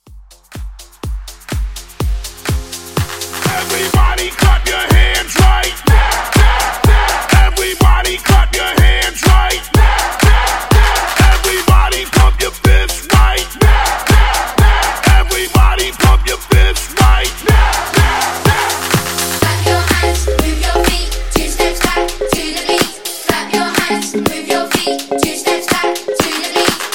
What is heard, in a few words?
EDM Hype Redrum; Remixfreakz